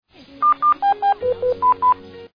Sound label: Buzz Buzz